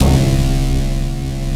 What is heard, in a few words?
Bass Analog & Synth; IRLANDBS; C2; USB Soundscan vol.12; AKAI; VINTAGE